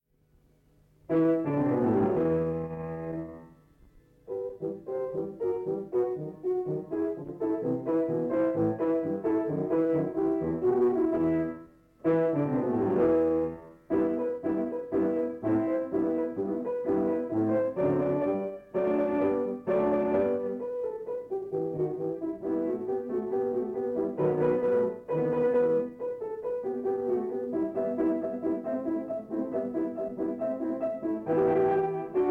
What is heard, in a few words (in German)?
klavier